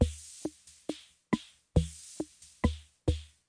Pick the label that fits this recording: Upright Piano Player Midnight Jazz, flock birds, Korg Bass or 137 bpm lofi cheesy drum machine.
137 bpm lofi cheesy drum machine